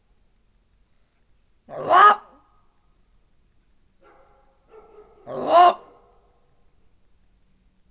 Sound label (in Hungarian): ket kialtas, mocsarihiuz; miskolci zoo 2009